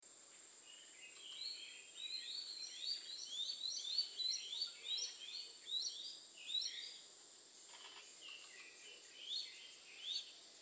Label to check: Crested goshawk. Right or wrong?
Right